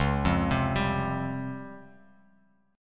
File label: Db Major Sharp 11th Db Bass Standard; arpeggiated; JGuitar